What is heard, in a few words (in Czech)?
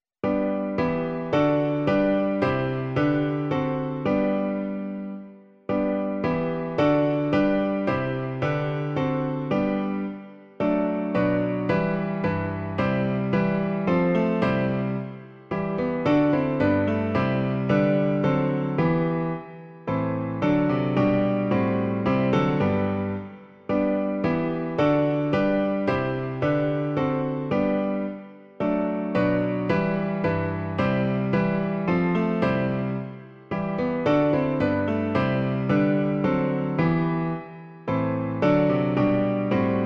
klavír; Hospodine všemohoucí; Klavírní verze